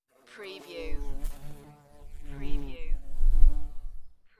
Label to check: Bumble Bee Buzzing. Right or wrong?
Right